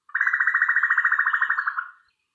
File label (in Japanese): kakkou